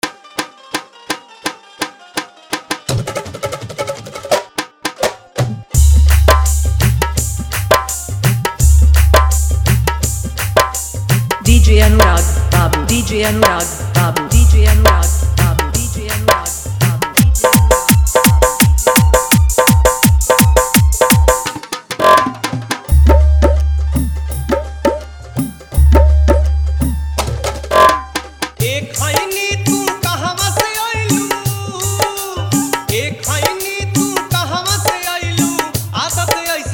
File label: Old Bhojpuri Hard Dhollki Bass Mix